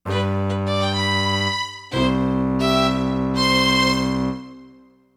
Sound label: Fiddle